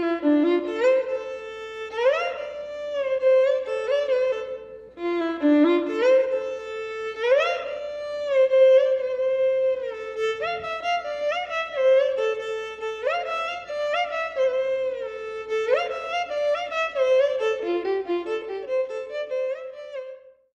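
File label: Violin background music Ringtone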